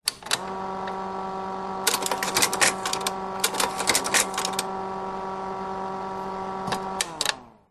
office slide projector vintage on automatic change slides x2 off voigtlander; 1960 voigtlander perkeo; zapsplat